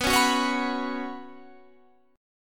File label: B Suspended 2nd, Guitar, Standard; Bsus2 strummed; 19,16,16,18,19,19; JGuitar